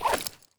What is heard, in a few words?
pistol draw; ins2; weapons